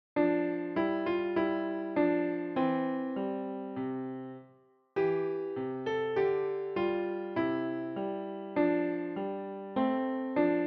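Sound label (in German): Klavier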